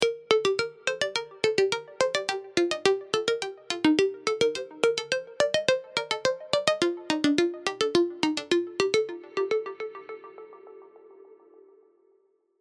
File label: serum plucks; chiqui audio